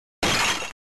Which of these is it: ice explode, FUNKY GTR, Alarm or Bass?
ice explode